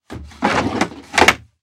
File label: Foley Pole Wood Pole Movements